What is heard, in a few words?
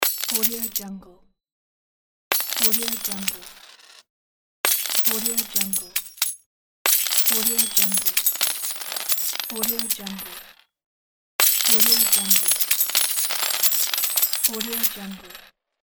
treasure payout